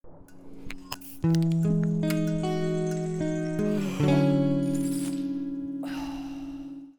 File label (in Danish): lydlogo; Alexander Vienberg; audio branding for laenken